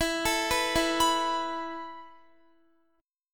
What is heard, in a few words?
E Suspended 4th, Guitar; arpeggiated; Esus4; x,19,19,16,16,18; 4E,4A,5D,5G,5C,6F